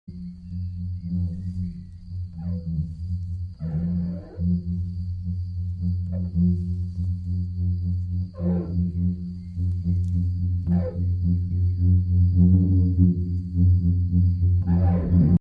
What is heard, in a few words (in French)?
cuica de jardin court